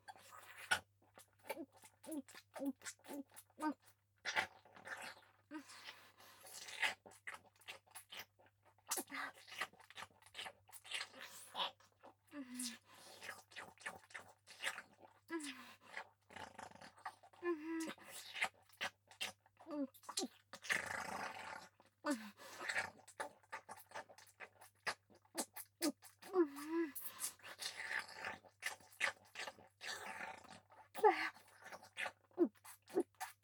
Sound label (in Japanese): フェラ・激しめ